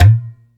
TABLA; AKAI S6000 CD-ROM - Volume 5

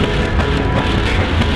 Grind; Sonic Foundry (Sony Creative Software); Hydroponic Hip-Hop

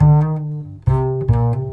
BASS LP, Drum & Bass, 140 BPM; Ueberschall; Drum & Bass Flash Trackz

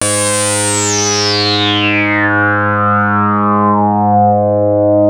Anasound Long mid G, Analog Single Notes; Sonic Foundry (Sony Creative Software)

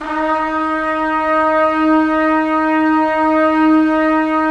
Trumpets; Roland - LA Composer vol. 4

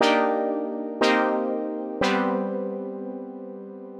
120 Pad Chords C, Keys, Nu Jazz House; PowerFX

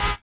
collision; Spectrasonics; Metamorphosis

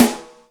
Buz Rg Rim, Yamaha DTXpress Acoustic Snare

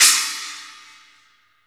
SPLASH, GM DRUM SET; AKAI S-Series CD-ROM Sound Library VOL-8

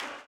CLAP, Blues Soul; Spectrasonics Vocal Planet

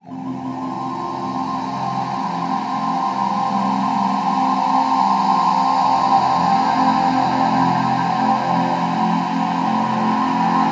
texture drift C, One Shot Textures; Transmission-X

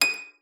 STOP D7, HARP; Xsample VOL-8; HARP+FX

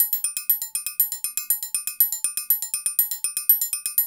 Udu Calypso

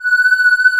MATRIX 12; E-MU Formula 4000 Series Vol. 3; Analog Odyssey

